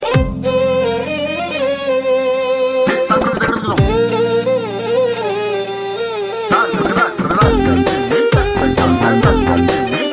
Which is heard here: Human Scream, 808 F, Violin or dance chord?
Violin